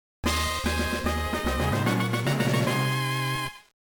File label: evolution fanfare; pokerogue; rse